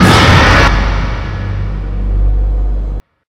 monster attack; piggy

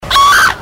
scream

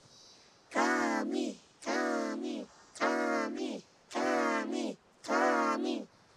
ami ami hehe

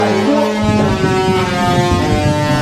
elevator turn on; elevator music

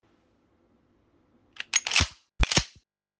mossberg 590

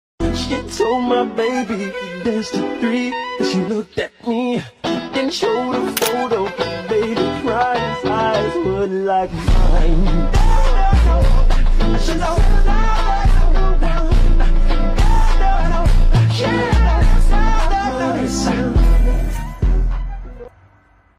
remix hoodtrap

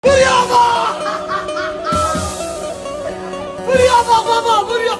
baaahhh

